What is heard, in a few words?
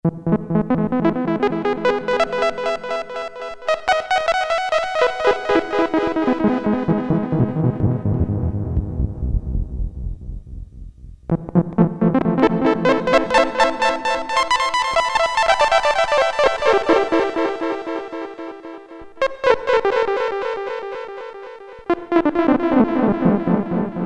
ECHO, Roland Jupiter-8; Factory patches